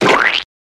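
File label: Squishy Banana Comedy, squeaks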